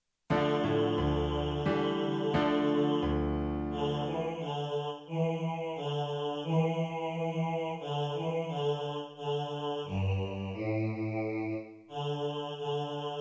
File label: rising green bass, choir